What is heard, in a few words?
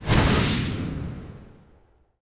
punch slow, battle